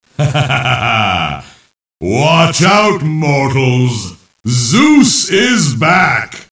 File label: zeus activated; knife v2; crit